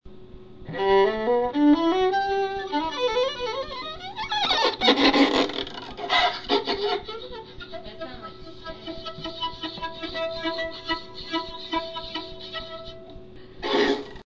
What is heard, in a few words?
Violin